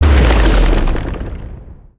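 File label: Earth Tornado Mid Blast; Spinjitsu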